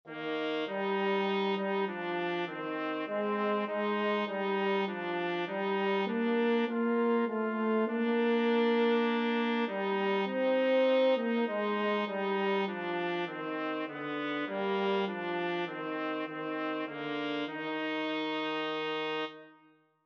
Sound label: horn trumpet duet; whileshepherds